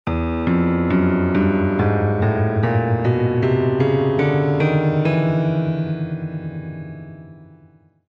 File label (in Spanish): piano normal